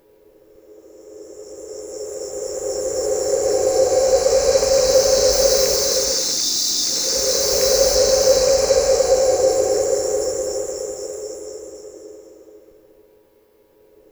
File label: CLOUDBURST; Distored Reality